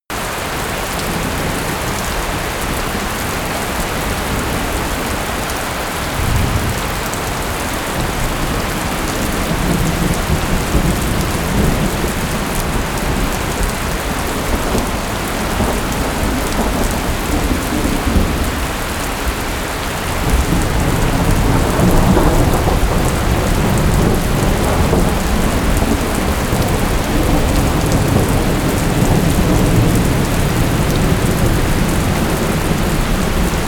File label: thunderstorm